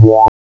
SWEEP; CMI Sound Library Rev 1.3

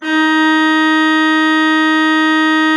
D4, Cathedral Organ; Propeller Island

